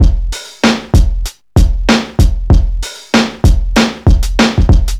96 bpm drum groove f key, kick tuned to the F note